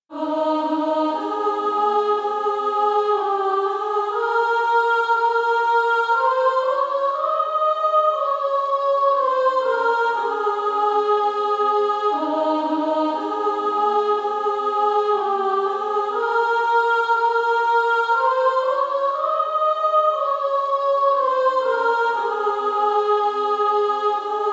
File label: Female Choir; Infant Holy